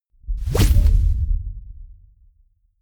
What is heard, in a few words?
sci-fi plasma gun fire fling; pistolen gewehre